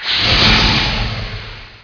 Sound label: Sound Magic